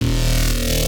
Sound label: E Bass Shot; neuro; BIG5AUDIO